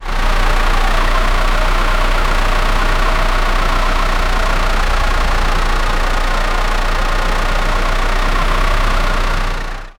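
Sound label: Renault Citybus 12m exhaust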